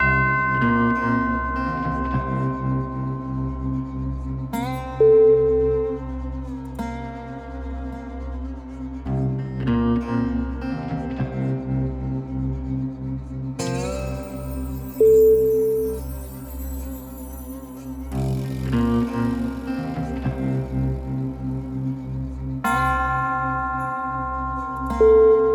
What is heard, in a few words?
tumbleweed western